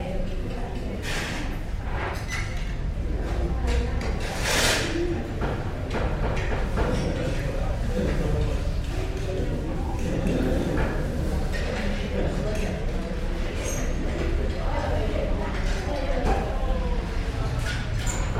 Ambience Chiang mai restaurant people chattering dishes distant; transportation; Articulated Sounds